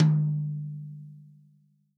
TOM, Real Drum Kit, POP KIT

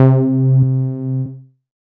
C2, SynthLine; NiceBeats

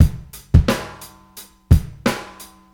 New School 87bpm, Hip-Hop Street Beats; Sonic Foundry; Hip-Hop Street Beats Construction Kit; Street Beats by Poogie Bell

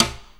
RIMSHOT; Back In Time Records; Big Bang; SNARES

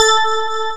SYNTHESIZER DIGI DECAY

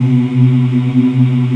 VOX African; Roland LCDP11 Africa VOL-1

